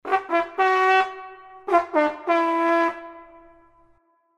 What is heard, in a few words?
bugle